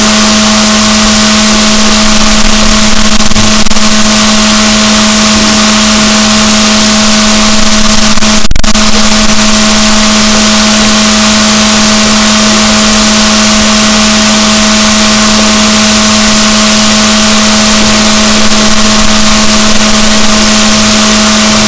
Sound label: rorquals negatif, boat; Chile